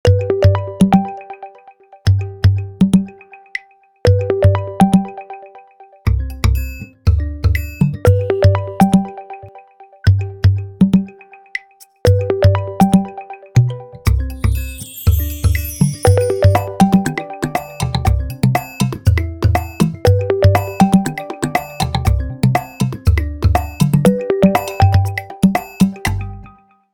Percussionist; G7; ringtones; LG

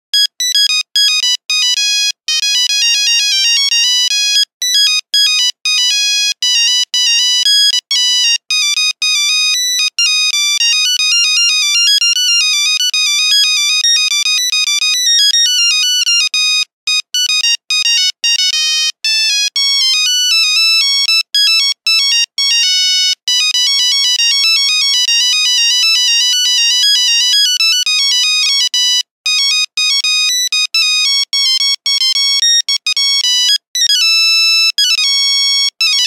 Nokia 1100 Badinerie, Nokia Buzzer; Windows Phone apps

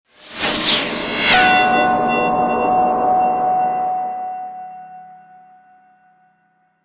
Alarm; Sony Ericsson; Venus (Xperia X1)

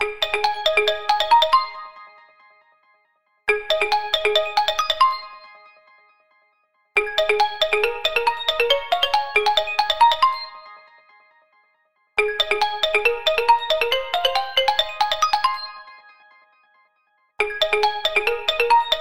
Jumping notes, ringtones; Tinno ATT Calypso

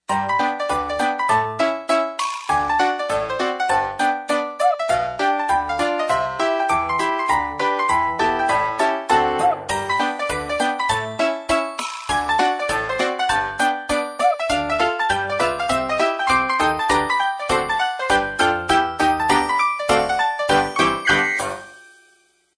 TapTap; Samsung SCH-i539; Basic

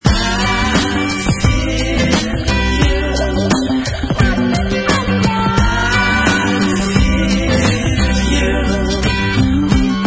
harmony; Motorola; ROKR E2

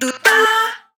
Tada, notifications

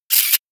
Shutter

